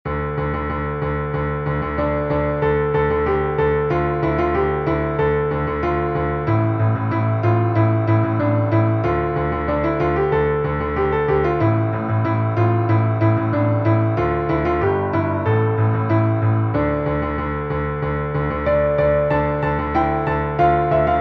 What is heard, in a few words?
Easy Four Hands Piano; Patapan